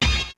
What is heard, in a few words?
confirm; ddr